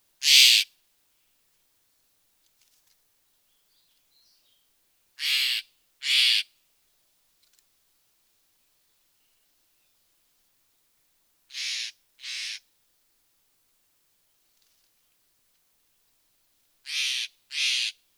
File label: Stellers Jay Sounds; All About Birds Cornell Lab of Ornithology